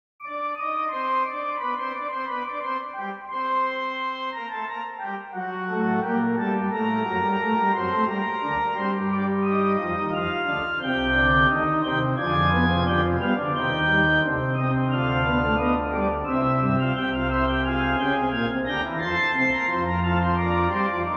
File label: Fugue in C minor